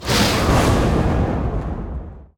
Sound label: Blackhole Call